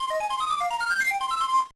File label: Jingle; Okuman Chouja Game Nottori Daisakusen; GBA